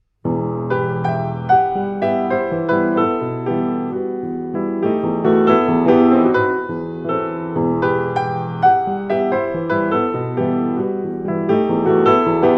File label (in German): Klavierstück in e moll Allegro ma non troppo